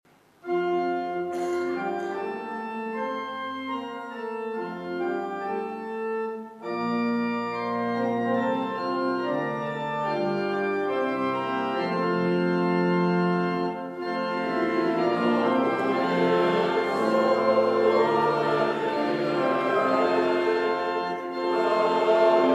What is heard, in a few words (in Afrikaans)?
Gemeentesang; Ps 145-1 verse 1,2,12